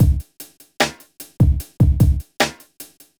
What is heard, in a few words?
hiphop